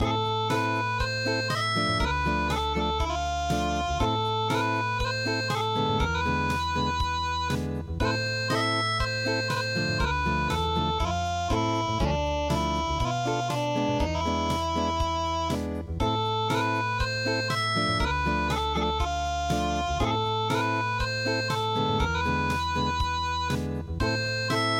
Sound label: Acoustic; full moon